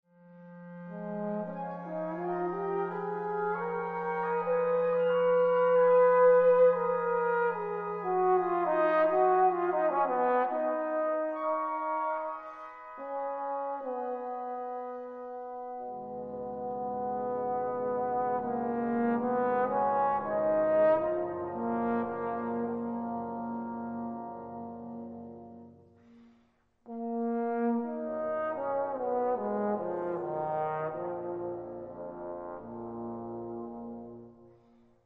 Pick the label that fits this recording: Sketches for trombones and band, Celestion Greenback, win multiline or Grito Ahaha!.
Sketches for trombones and band